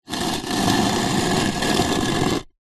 foley heavy stone or concrete block drag on ground